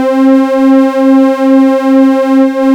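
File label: MOOG MODULAR C4; Hollywood Edge - Giorgio Moroder Rare Synthesizer Collection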